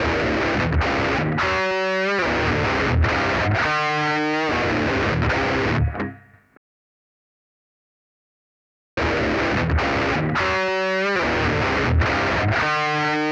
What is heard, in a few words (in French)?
guitare satu